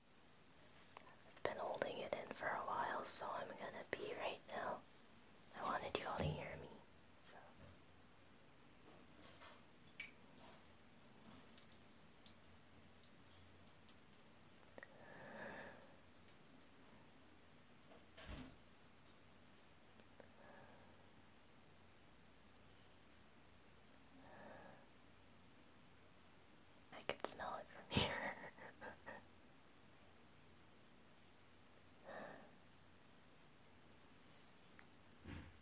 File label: some whispers and giggles, peeing; watersports